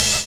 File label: LOUD KICK; Univers Sons